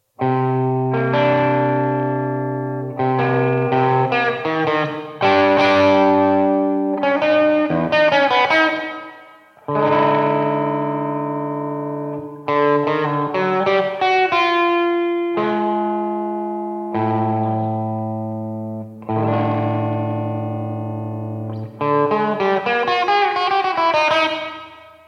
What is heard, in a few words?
sonata for solo 17 tone guitar wistful and halting